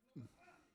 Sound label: Voc